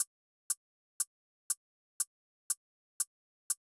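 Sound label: Hat Closed; VariBreaks; hydrogen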